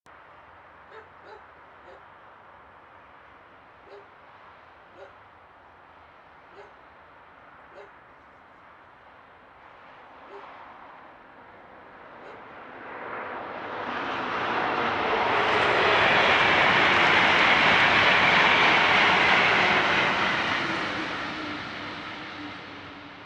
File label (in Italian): Treno mono; Eigenmike; A-weighted; Nuova Strada